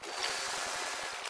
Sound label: Mounted-Camera Photo Print; viewfinder